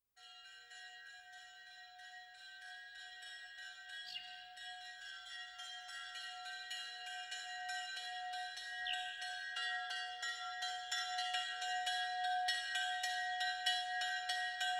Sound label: Little Dunkeld Bell; bell exhibition